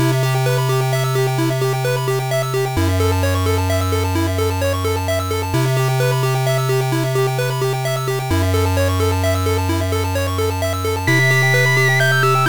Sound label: 8bit Mysterious Place